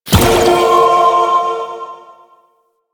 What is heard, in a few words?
Spirit Primary Fire D; Warframe; Grineer Thumper Bot; Sentient Spirits